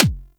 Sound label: Weapon Punch Pistol; Warframe; Weapons; Pistols